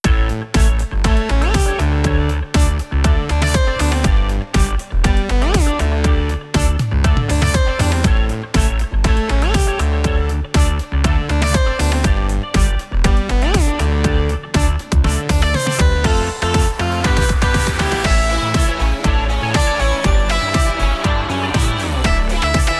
Stage Electro Funk; club penguin island original soundtrack

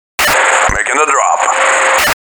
Text message tone; Payday The Game; Making the drop!

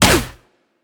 Weapon Shoot

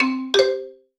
Solo Xylophone Positive, stereo; MUSIC EFFECT; Kitty Nigiri

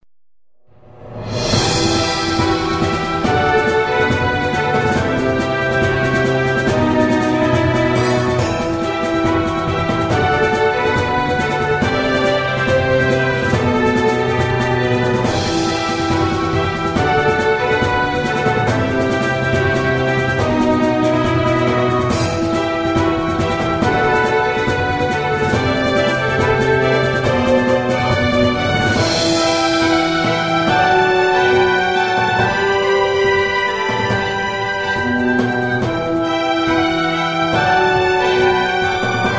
Warning; Chronus C-Inc; Hiyoko Breeding Clerk; android

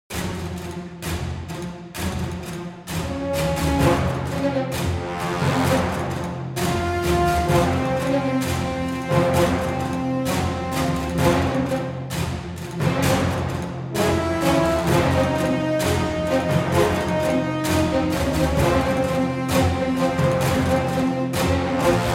Boss Phase 3 Mix; children of morta; Temple Music

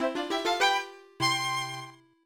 Dungeon Clear; Pokemon Mystery Dungeon Blue Rescue Team

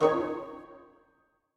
Goblin Rise; King's Quest Chapter 1: A Knight to Remember

